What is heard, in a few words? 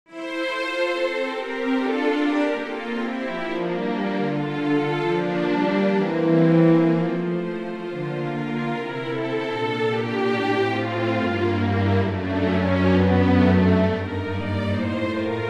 half Strings passage